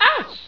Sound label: OUCH; HUMAN